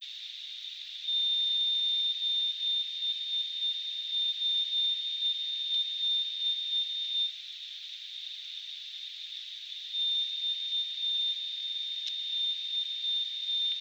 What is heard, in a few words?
noise